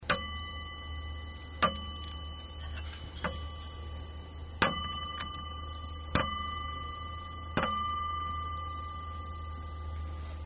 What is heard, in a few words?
ashtray; fieldrecs